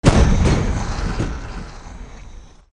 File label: supertank acid main barrel interrior; modern weapons; golgotha